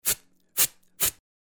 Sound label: Buck Challenge, Deer